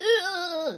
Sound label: "hehehehe"; jocly